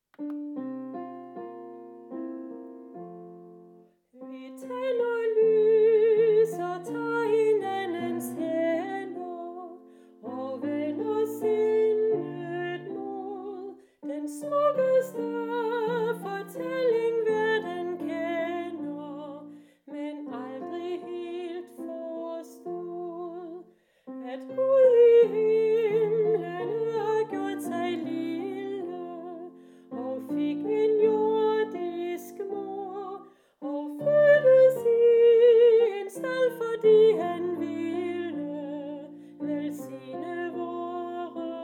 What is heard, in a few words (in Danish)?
sopran; vi taender lys